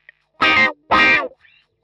125 wah C, wahwah; PowerFX - Nu Jazz House

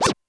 Scratch, Drum Machines Alesis D4

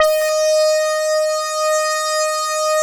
BURST; Big Fish Audio - First Call Vintage Keyboards

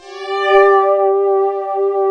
PAD Pulse Pad; Best Service Gigapack 1

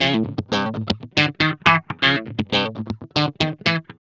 GUI, LICKS; 120 LICKS

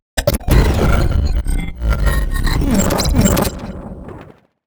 portal glitch

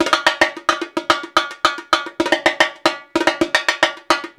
120 Rio Batucada; Spectrasonics; Supreme Beats; World Dance

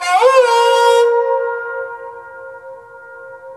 SARANGI, Indian Ensemble

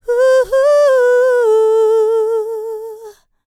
LICKS, Vocal, R&B; ILIO; Dance; LT R+B

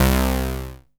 SYN, KEYS; Alpine Volksmusik

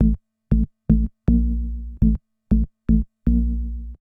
SH101 Bass Loop (120BPM), retro house

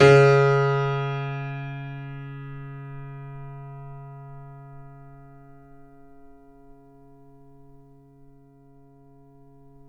FF C#2, Grand Piano off mic; AKAI S6000 CD-ROM - Volume 2

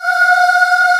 C 5 CHOIR MX; AKAI S6000 CD-ROM - Volume 1; VOCAL ORGAN; ORCH CHOIR

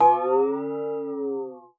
WATER, PERC; Xsample VOL-9

